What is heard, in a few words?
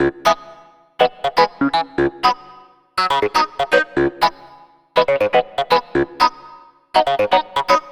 Clavinet; Dr. Fink's Funk Factory